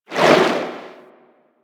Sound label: Shark Tail Move Swim; Warframe; Grineer Ocean Shark